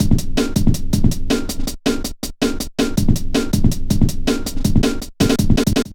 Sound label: metallic stepz, drum loops